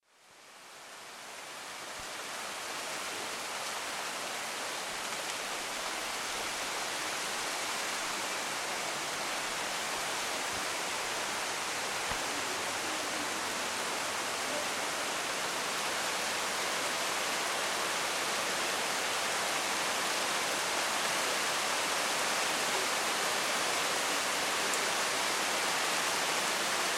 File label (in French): Petite puis grosse averse